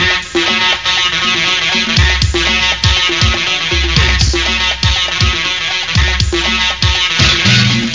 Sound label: bass break